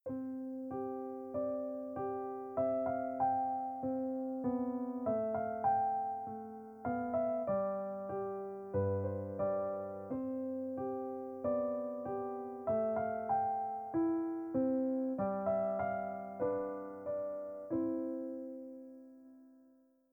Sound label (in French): enregistrement musique